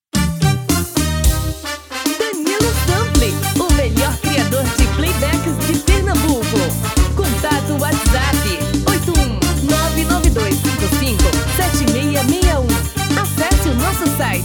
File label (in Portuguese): Frevo Cancao